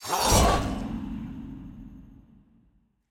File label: hell Character Win; archangels